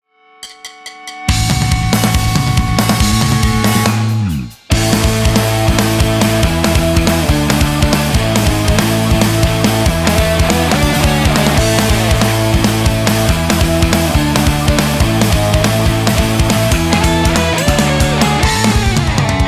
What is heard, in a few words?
(Instrumental)